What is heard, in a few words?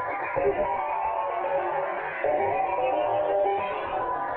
Tonal Re Draw, sparse soundscape; Loops